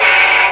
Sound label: g maj 7th, guitar chords